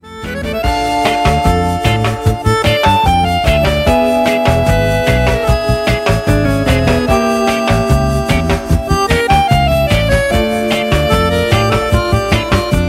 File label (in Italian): reggae tango